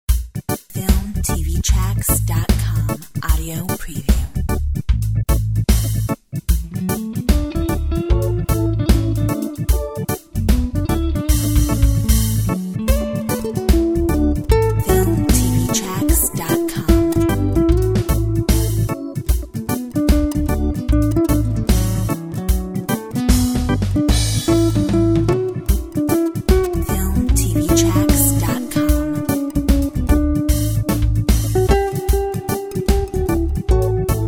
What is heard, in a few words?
Reggae roots